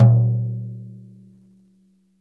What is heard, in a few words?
floor tom - snares off; JazzFunkKit